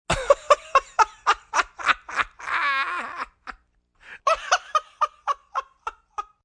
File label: Laugh Chortle, Laugh, male, laughing hilariously (human voice); Laughter Sound Effects